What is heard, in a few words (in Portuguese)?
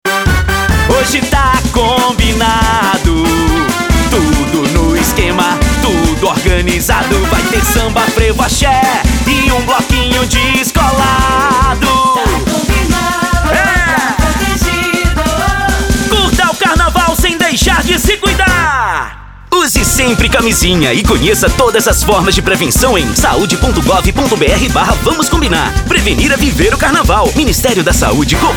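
frevo sem vocal; ms aids ta combinado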